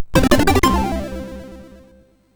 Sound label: Robot Jet Action, MOS6581R4